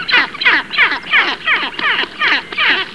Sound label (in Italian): Stercorario maggiore